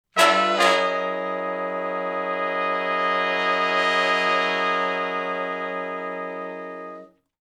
Shf Blus Thick End Chds [bII, I] (F#), Horncraft for R&B; Sonic Foundry (Sony Creative Software); Sections